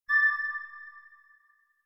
Vanish; LOOPERS

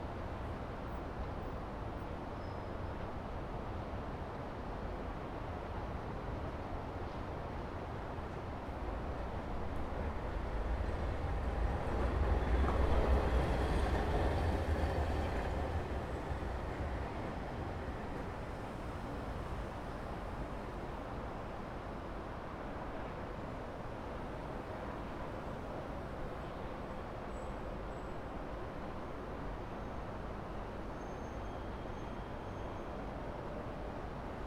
Traffic Sound; LOOPERS

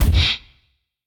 Kick; LOOPERS

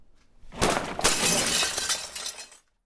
Kick Cupboard; LOOPERS

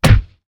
Head Butting; LOOPERS

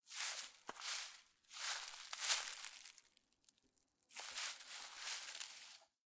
Search In Grassy Places; LOOPERS

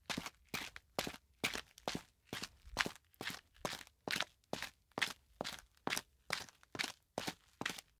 Footsteps Loafers; LOOPERS

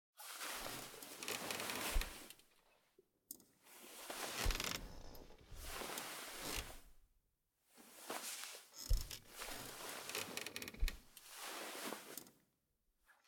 Treasure Hunt; LOOPERS

